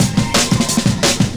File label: 175, Breaks; Drum&Bass; EZ Rollers